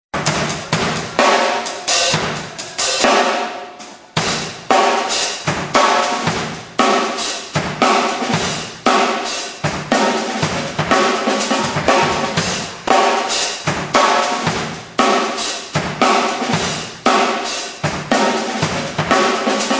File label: drums